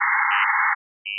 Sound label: tone hi